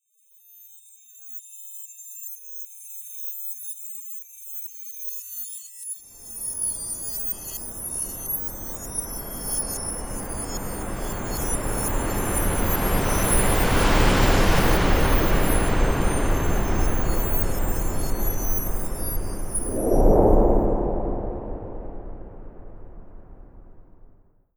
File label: Rain; Ruins End Symbol Rain Quad